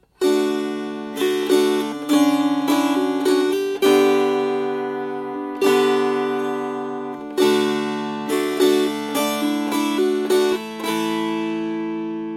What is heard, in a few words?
Strummed